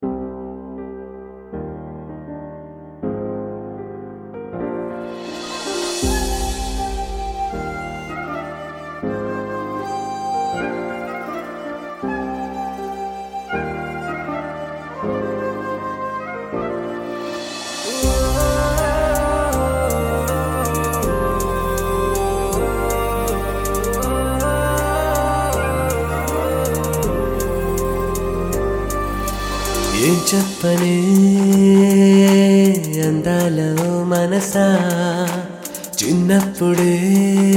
Male

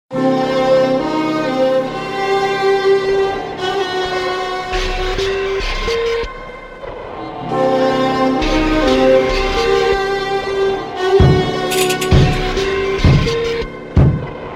Dubstep Symphony

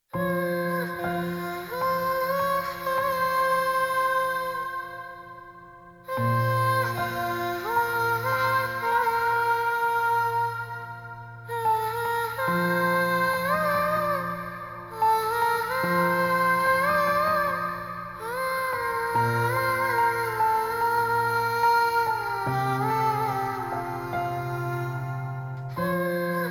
Theme Voices

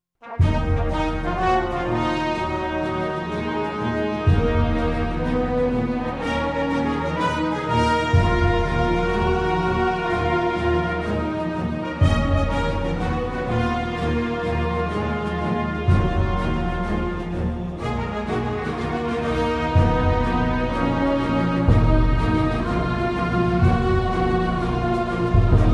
Orchestral Version

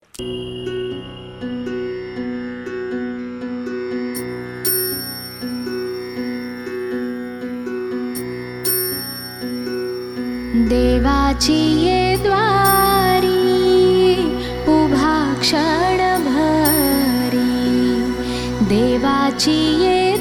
Female Version

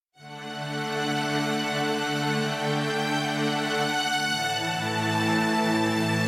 Instrumental

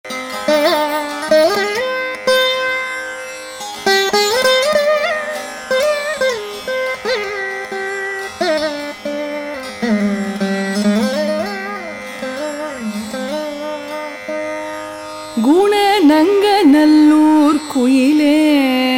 Kalyani Chanting; Sri Rajarajeshwari Andhadhi

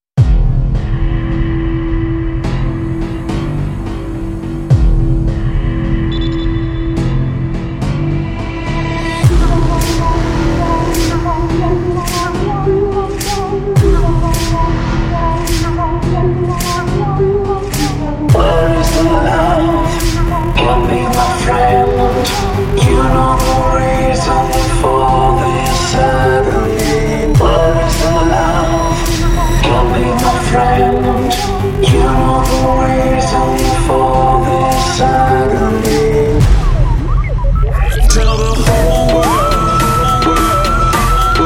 Theme Background Score

